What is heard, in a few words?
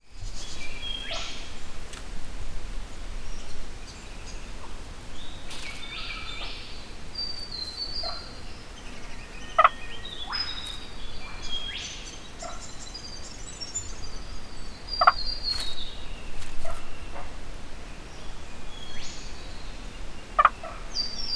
nature rainforest subtropical australia by water hole unidentified animal frog; call birds; zapsplat